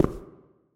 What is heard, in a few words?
cave grounds step; Golden Crayon Sounds